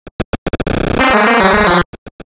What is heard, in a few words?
chainsaw kill, atari 2600; the texas chainsaw massacre